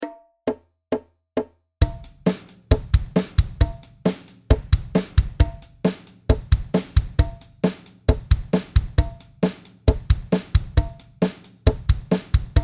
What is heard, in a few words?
interlude 134